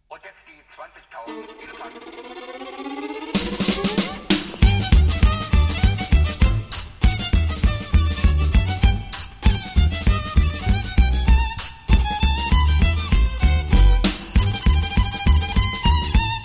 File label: circus